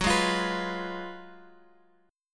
Gb Major 7th Suspended 2nd Suspended 4th Guitar Open F